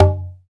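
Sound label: PER PROCU, Procussion, percussion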